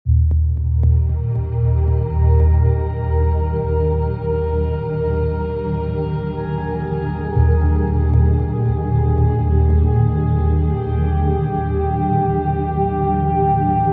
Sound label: Soothing Harmony